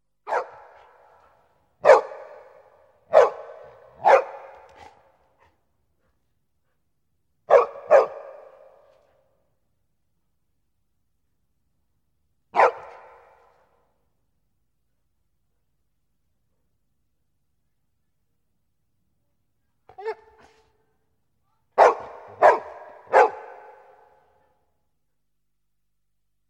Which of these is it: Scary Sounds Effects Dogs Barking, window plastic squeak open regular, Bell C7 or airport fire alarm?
Scary Sounds Effects Dogs Barking